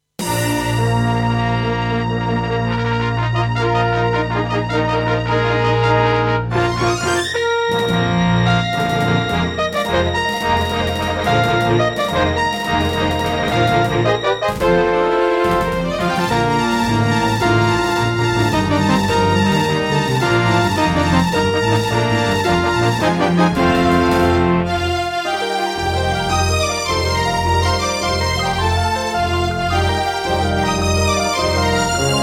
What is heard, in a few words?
Intro, Roland SD-50 GS MODE; Dark Forces